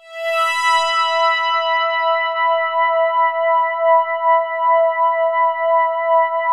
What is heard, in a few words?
5th hard E4; SPACIOUS; Music Store - Claudius Diemeter Schwerlos; SPACIOUS 1